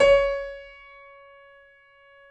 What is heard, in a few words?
DB4, YC7 Piano mf